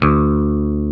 PICKED D3, Classic Guitars; E-MU Formula 4000 Series Vol. 3; Analog Odyssey

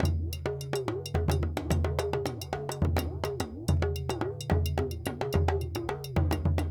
Djoun, World Percussion

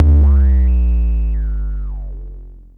BASS ENV, Dual Percussion Synthesiser; Klone

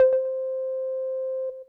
Synth C, Synth Prophet One Shots; Electronisounds

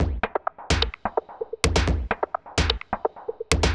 Percussive

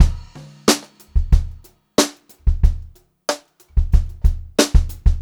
Brushed Drum Loops; USB Soundscan vol.39; AKAI

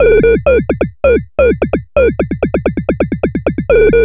130 bpm

